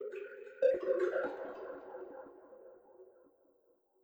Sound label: Jar